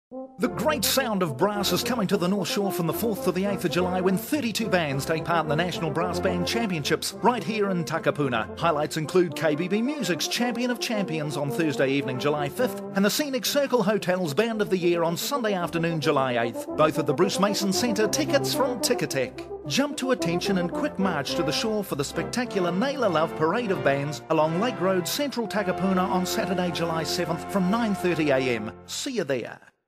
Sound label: Brass Band